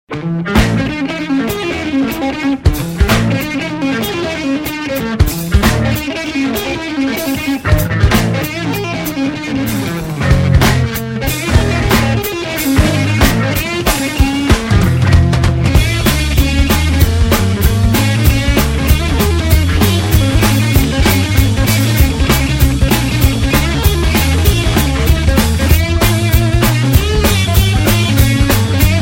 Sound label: stratocaster guitar, rock; ringtones